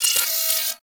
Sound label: Turret Harness Movement One Off